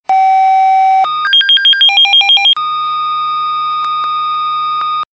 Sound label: Alert